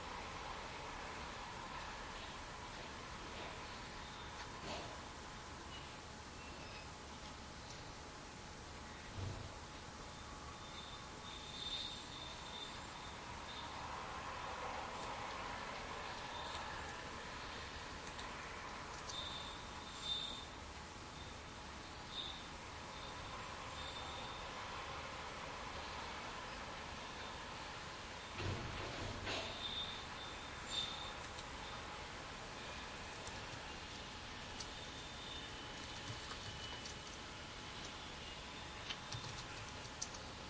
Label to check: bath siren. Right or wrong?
Right